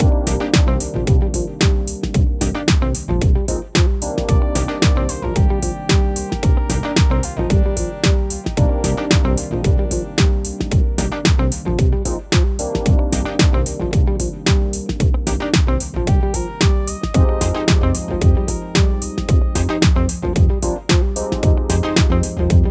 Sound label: Full Mix, Deeper Cm 112bpm, Nu Disco; Zero-G; Construction Kits